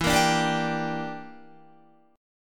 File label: E Minor 7th Flat 5th, Guitar; Em7b5; strummed